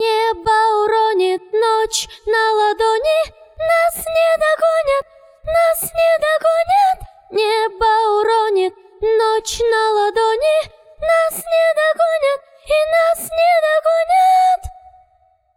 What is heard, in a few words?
VOX Ref MIXED, acapellas